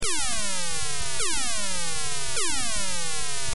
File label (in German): musik